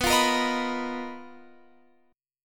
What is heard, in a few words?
B Major 7th Flat 5th B down Guitar Open F; strummed